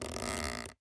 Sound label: process begin, casual taps; hellbound